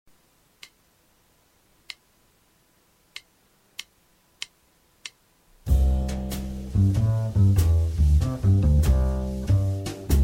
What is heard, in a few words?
Backing, jazz